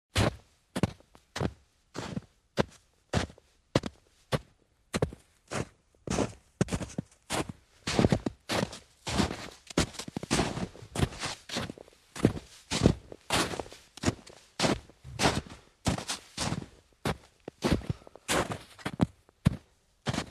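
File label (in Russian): быстро идёт по неглубокому снегу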